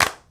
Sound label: CLAPS; CLAPS+SNIPS